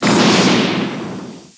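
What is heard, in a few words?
door close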